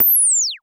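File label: FREQ LONG, Dual Percussion Synthesiser; Klone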